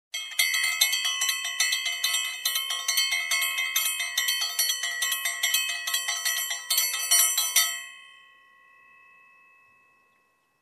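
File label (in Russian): звон железного треугольника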